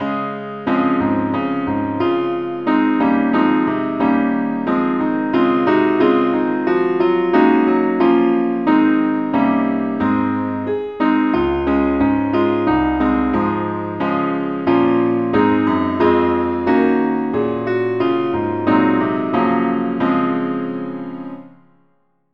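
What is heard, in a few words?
keyboard only, key D flat; saintthomas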